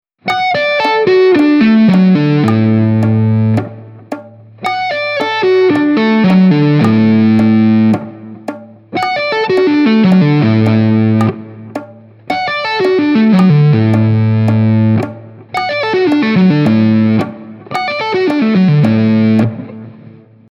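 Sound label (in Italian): Esercizio Rivolto discendente, chitarra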